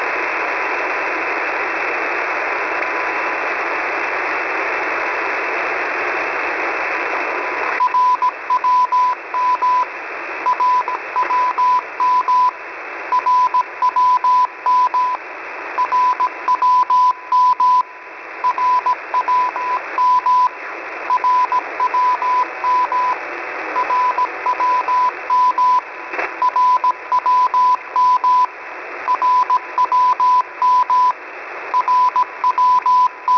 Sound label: Moscow time signal CW ID; RWM; kiwisdr; 4995.00 usb